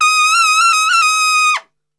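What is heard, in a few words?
D# trumpet shake; Best Service; Brass Super Section; TRP SHAKE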